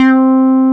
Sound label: SUP BASS, JV-1080